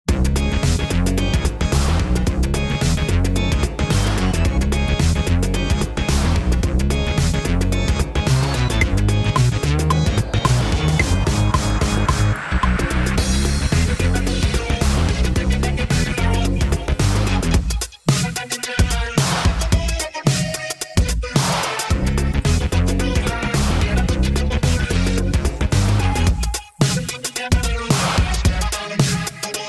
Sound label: Battle Dance; Digicalized; Android